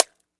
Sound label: egg; Insects